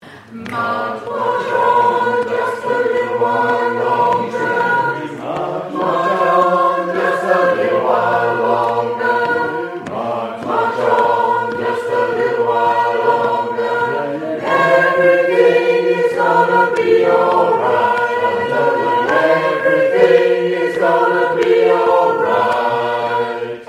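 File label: soprano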